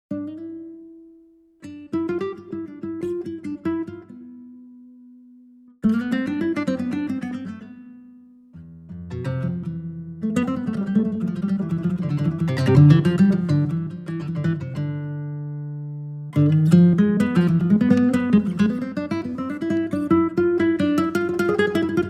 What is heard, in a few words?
Spanish Flavour, Nylon Guitar